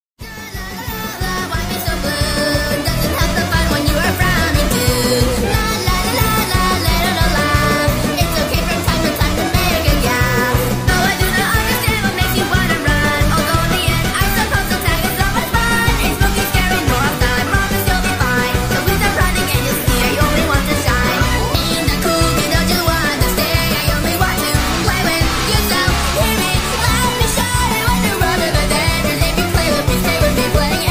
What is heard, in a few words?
coolkidd singing; roblox